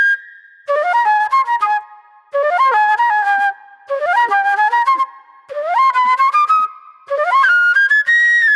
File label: Raw Funk Flute